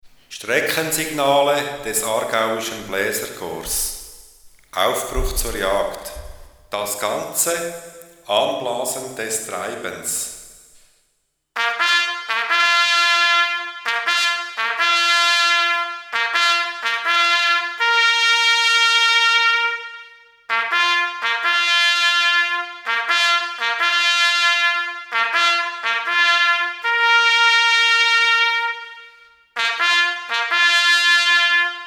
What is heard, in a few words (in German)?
Aufbruch zur Jagd, Jagdhorn